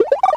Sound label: Pound Begin; N64; yoshi; YOSHISISLAND